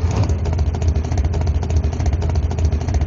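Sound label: harley davidson; BALLY; harley davindson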